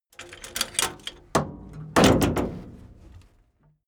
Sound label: tank medium hatch open